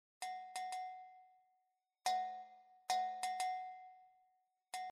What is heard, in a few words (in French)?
cloche; afrique; ouest